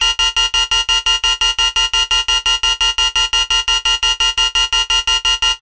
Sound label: science fiction alarm loop